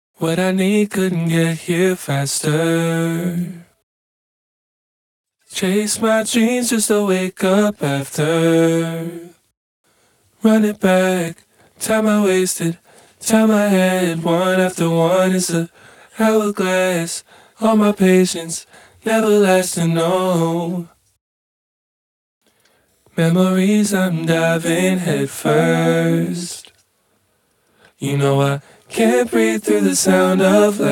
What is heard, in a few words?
Vocal Main and Harmonies; Loops; Tonal; Ableton Live 12 Lite; Core Library